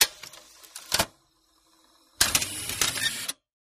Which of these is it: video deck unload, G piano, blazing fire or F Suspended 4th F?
video deck unload